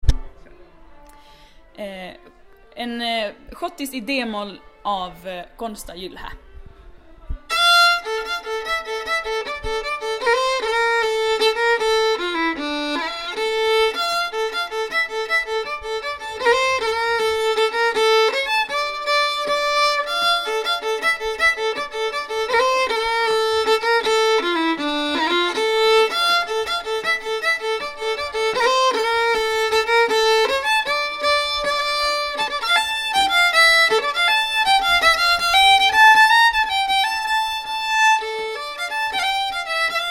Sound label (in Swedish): Shottis D-moll; Konsta Jylhä